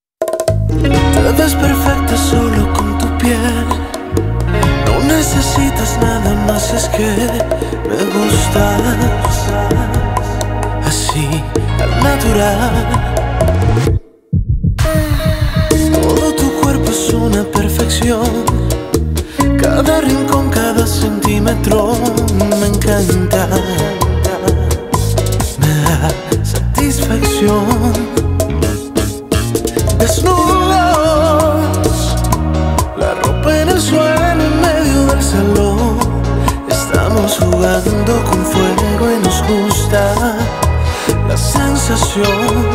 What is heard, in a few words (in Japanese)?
バチャータ課題曲